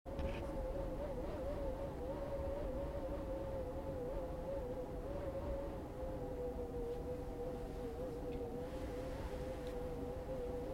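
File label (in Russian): дверной сквозняк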